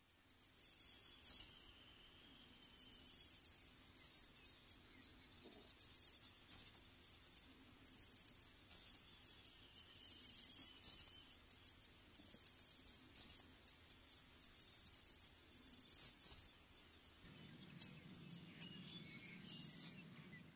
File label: Great crested Flycatcher